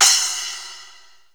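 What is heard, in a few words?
Yamaha RX5 splash, Drums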